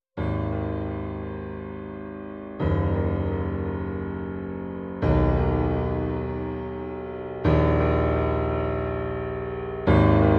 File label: Strings and Piano Mix